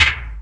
TOM LOW, Big and Heavy Real Drum Kit; REAL LIVE KITS

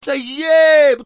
say yeaah, male singing

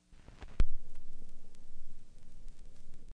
vinyl pop; Various Strange Sounds and Effects

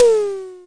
Simmons SD-5

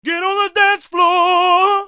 get on the dance floor, MALE SINGING

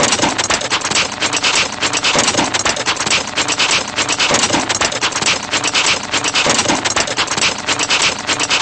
vintage jalopy motor running; Cartoon Sound Effects Kit

